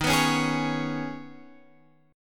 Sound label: D sharp 7th Suspended 4th Sharp 5th D sharp; strummed; D#7sus4#5; x,6,9,6,9,9; JGuitar